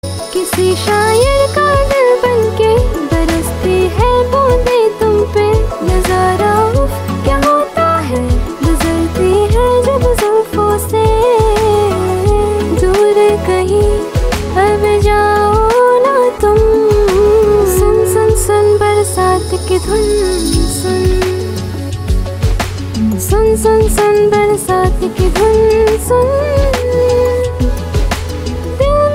Female Version

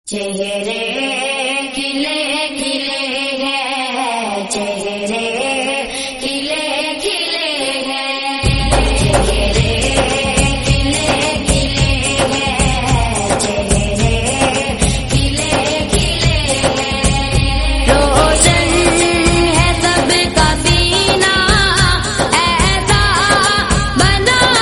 islamic song

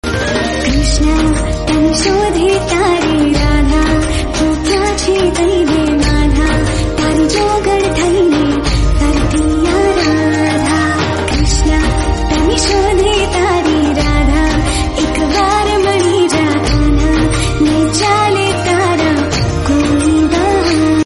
gujarati song

